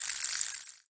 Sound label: spin end; fidget spinner battle